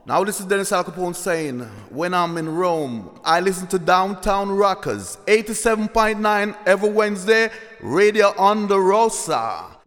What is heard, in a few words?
jingle